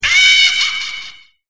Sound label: screamy laugh, graveyard